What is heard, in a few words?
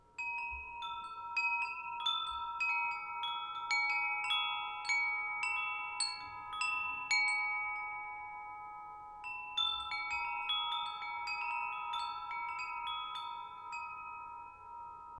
Kanarit, chimes